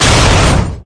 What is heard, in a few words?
Vehicles; Scorpion